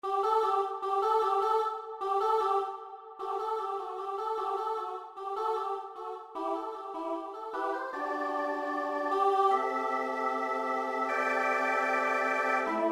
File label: butterfly MIDI